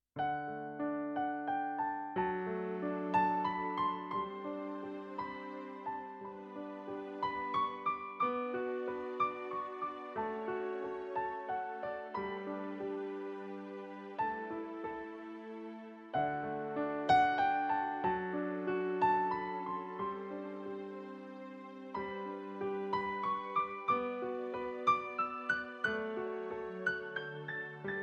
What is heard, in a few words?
piano moment